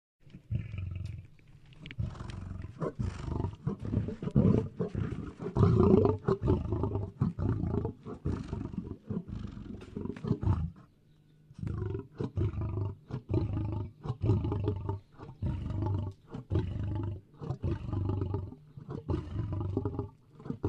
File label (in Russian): lev urchit i vorchit